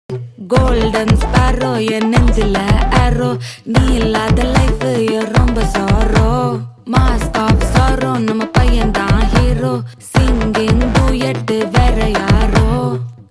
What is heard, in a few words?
music song tamil dance